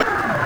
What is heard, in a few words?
FX, Futurist Drum & Bass